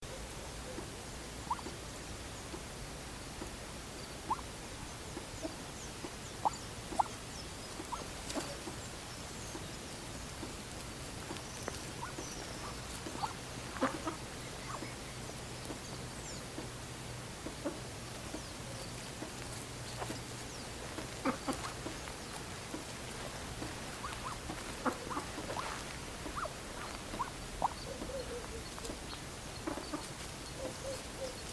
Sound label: Muscovy duck; اردک مسکویی